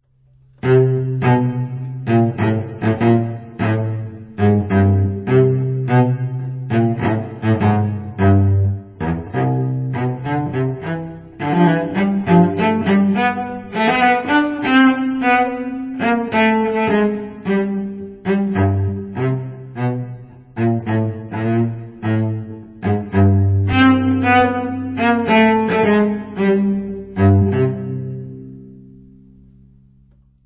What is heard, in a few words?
Cello solo